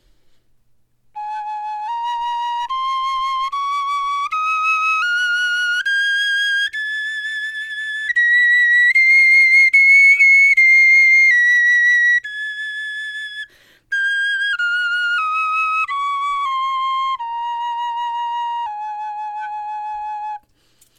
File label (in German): tonleiter, knochenfloeten